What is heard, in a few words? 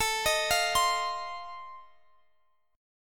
F 7th A